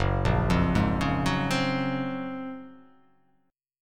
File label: Gb Major Sharp 11th chord; GbM#11; arpeggiated; JGuitar